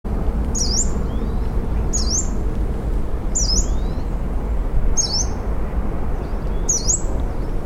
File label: warbler yellow-browed Phylloscopus inornatus